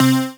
SYN Pluktikal

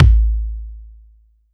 BD

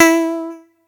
atari 520 st

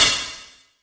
Ride Cymbal

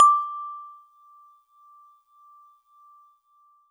Celeste DX100 D5; Pristine Celeste

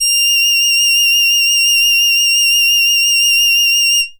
Box Cello DX100 F7

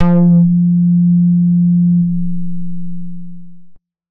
90s club bass F4

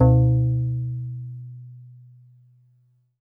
Steel Drums DX100 B1

